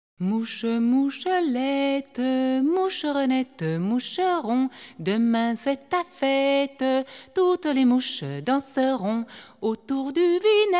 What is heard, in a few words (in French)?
chanson, franz